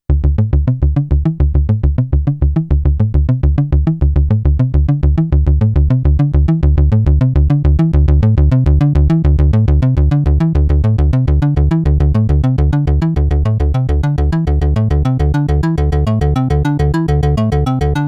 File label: Behringer Neutron Synthesizer Filtered Sequence